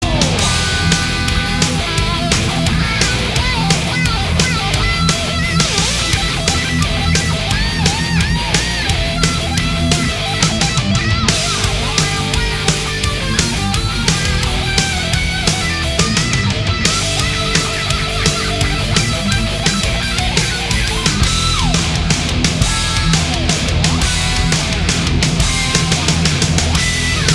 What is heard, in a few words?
solo